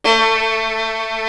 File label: SOLO VIOLIN; East West; Global Sounds